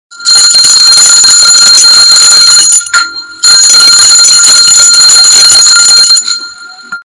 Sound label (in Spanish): Alarma